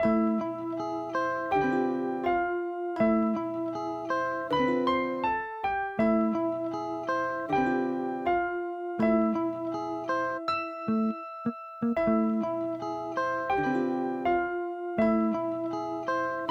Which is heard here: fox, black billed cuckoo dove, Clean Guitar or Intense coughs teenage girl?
Clean Guitar